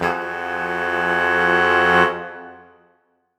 Horn Swell Fmajminb6; undercover samples

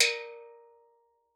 A#3, STEELDRUMS; Xsample VOL-4

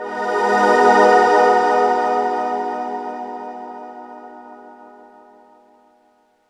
G#m7, Chords; Electronisounds

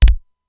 BD, Simmons SDS-9

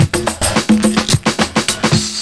PERCS, 70 s Breakbeats

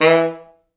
E2, Pop Brass; Best Service ProSamples vol.19; AKAI

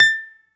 BALALAIKA, Prima Balalaika, Plucked Strings

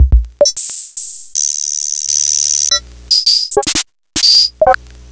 Boss DR-70

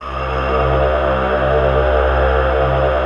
ATM TONAL; Best Service - XXL Production Effects

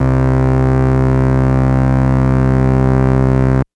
Organ Farfisa c1; TrashLibrary

